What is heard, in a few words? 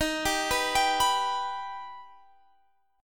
D sharp Augmented D sharp, chord; D#+ arpeggiated; 23,22,21,24,24,x